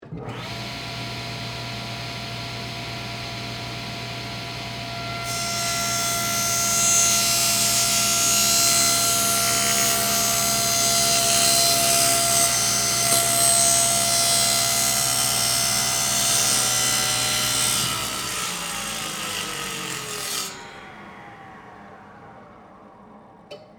Table Saw Cut Wood, Industry, Table, Saw, Cut, 1X4, Wood, On, Run, Off; Sanders